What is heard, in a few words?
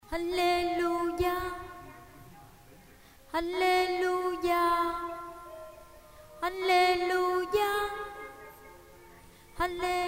basso